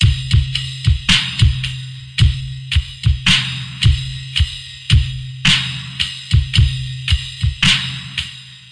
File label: 55 bpm brain broken skank